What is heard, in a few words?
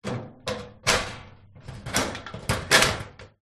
industrial metal cabinet bolt unlock then lock; zapsplat